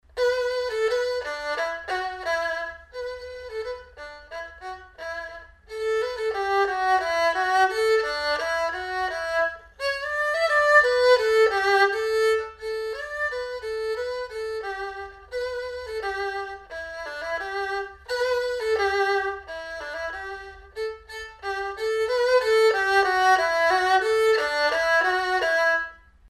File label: erhu